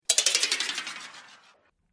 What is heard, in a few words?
Arcane Magic Clockwork; Magic Sound Effects